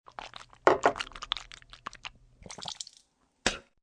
Swishing Spit, Swishing, gargling, spitting, sound of glass/cup being put down (human voice); Voice Box